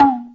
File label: bamboo; osu